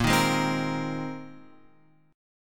Bb 7th Suspended 2nd Suspended 4th down Guitar Open F; Bb7sus2sus4; strummed; JGuitar